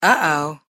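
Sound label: woman "oou"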